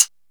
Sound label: Original Hi Hat G#, Royality free pedal hi-hat sound tuned to the G# note; G# Key